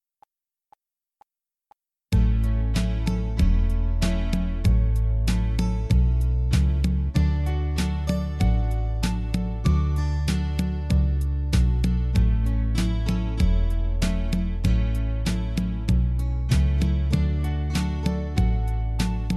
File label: D, backing tracks irish country pop